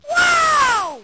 lofi sega sample; 8 BIT; Lofi Sega Samples

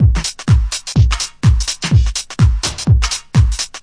basic house, drum loops and breaks

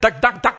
dec dak dow, Indian singing; VOCALS and SPOKEN WORD

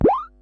silly elec fx

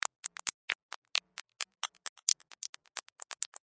132 micro blips; REMIXABLE COLLECTIONS; Kraddy Collection

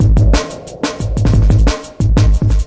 180 moody groove, drum loops and breaks; 161 to 180 bpm

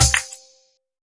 Processed Ethn Perc, Doru Malaia Percussion

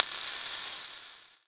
Fracture Perc, Found Percussion Hits; UNUSUAL KITS and FX; ModeAudio

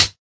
hat; Hats

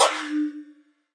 Fracture Hi Hat, Found Percussion Hits; UNUSUAL KITS and FX; ModeAudio

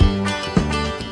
ozark mountain two step; SYNTH AND ELECTRONIC LOOPS

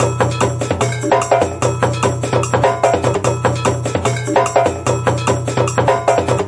native brazil, drum loops and breaks; 141 to 160 bpm

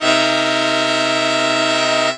dissonant horn hit

